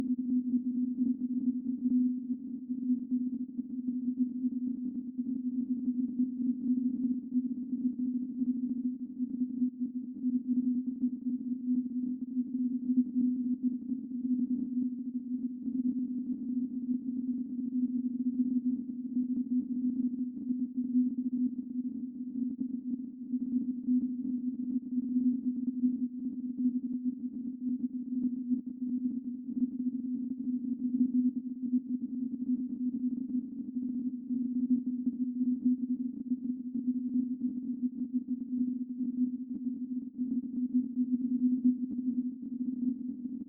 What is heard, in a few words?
EEG sonification